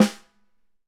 Ludwig Snare; Digital Sound Factory

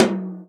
tom, Real Drum Kit, jungle kit

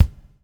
BUBL, BUBBLE; Spectrasonics - Backbeat

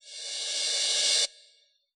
reverse cymbal; AnlgKit1

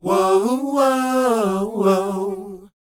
WHOA; ILIO; Vocal Planet

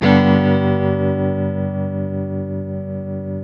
TELE CHORDS MAJ G#, Guitars; Spectrasonics; Hans Zimmer Guitars VOL-1

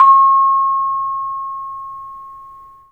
VIB; 3D Sound; 3D Keys VOL-1; 3D VIBES

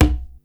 tumba heel, Yamaha Motif ES drum machine; 300 Drum Machines; Yamaha Motif ES-Series

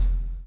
CHAIN; Spectrasonics - Backbeat

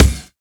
Kick, Dance Drum Kit; Drum Oneshots; Twilight; Monosounds

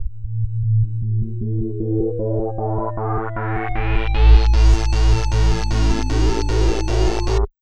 chords

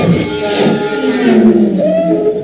SPEEDER; STARS FXS; East West; Global Sounds

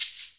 SHK, Shaker; Univers Sons; Basicussions

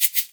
SHAKER; InVision Interactive

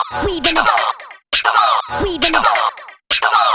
breaks vox smash 135

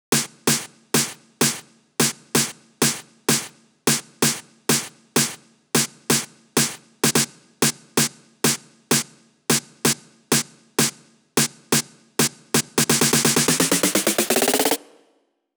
128 BPM Snare; Vengeance - Essential Clubsounds Vol.5; Big Line Kit; Root G; Drums & Perc

